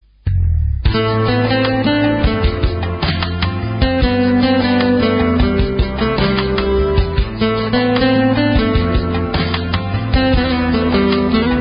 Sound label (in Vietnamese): nhac nen; KHTN 7 Chân trời sáng tạo